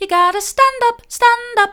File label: you've gotta stand up mid, vocal